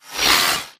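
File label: Drone Movement Turn; Titanfall 2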